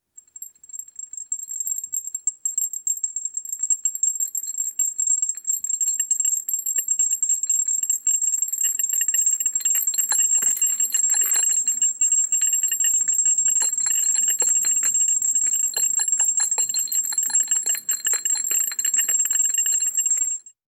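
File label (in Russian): Люстра трясется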